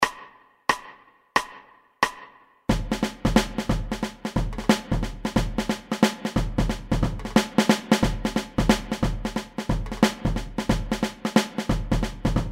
Batidao Caixa, samba

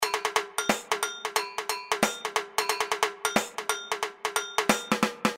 Batidao Breakdown, samba

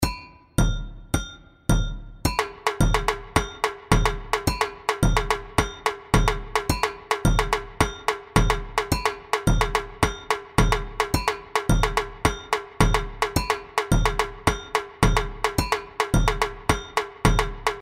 Teleco, Tamborim, samba, Batucada; TelecoTeco

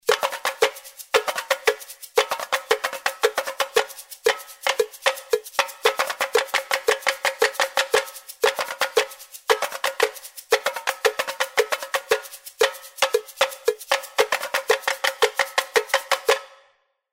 Tambo Break, Tamborim, samba, Batucada

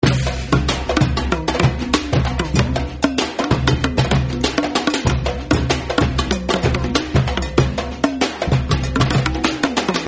Batidao Loop, samba

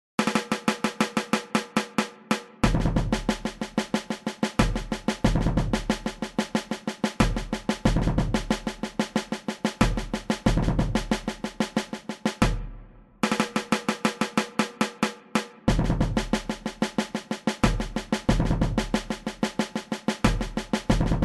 Surdo; samba; gutchaka

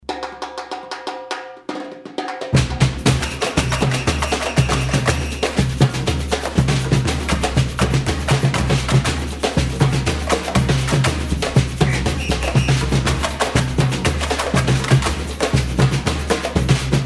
Tambo Desenho, Tamborim, samba, Batucada

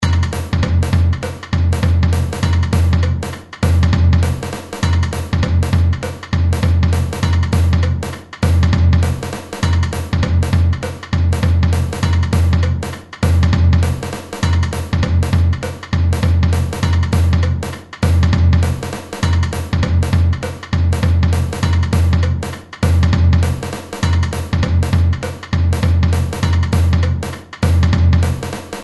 Batidao Breakdown Caixa and Surdo, samba

